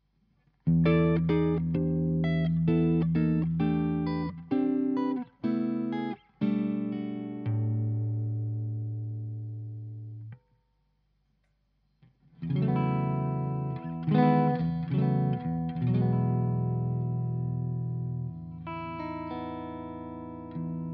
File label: EHX 5150 Clean L3 SM57